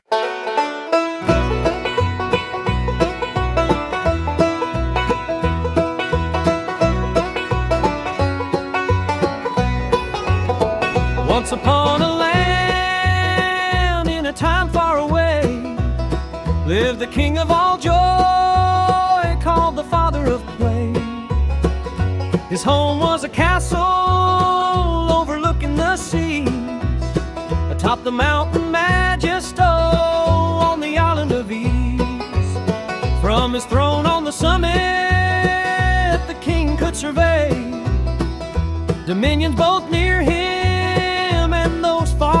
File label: bluegrass